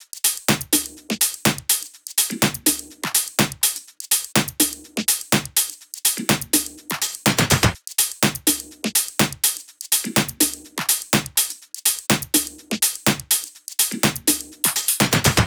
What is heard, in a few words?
loop top 124, Seismic Loops